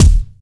clear kick drum sound d sharp key; kick drum samples

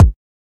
old school verby kick drum single hit F sharp key

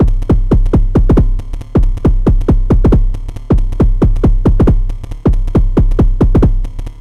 kick and perc analog techno volcadrum - 137 gm; drum loops

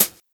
focused high hat sample c key; hat samples

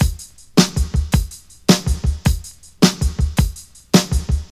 107 bpm drum loop sample d sharp key

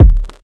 perfect bass drum sample d key; kick

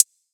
hi hat b key; drum single hits one shots

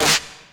nineties trip hop snare drum sound d sharp key

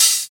subtle high hat sound c key; hat samples

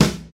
low steel snare drum sound e key

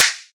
trap hand clap sound b key

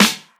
modern raw acoustic snare g sharp key

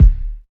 kick one shot c sharp key; kick drum samples

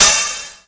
low treble open hi hat sample f sharp key

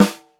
deep steel snare drum g key

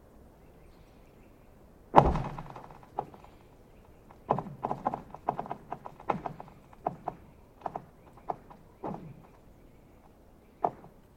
ice cracks

